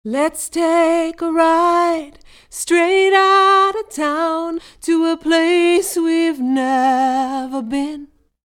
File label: Dry Vocals